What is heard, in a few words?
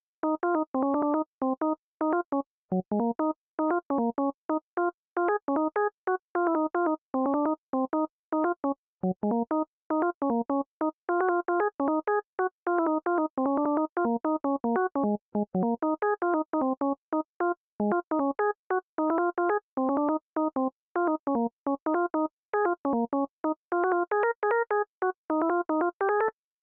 Melody; chippy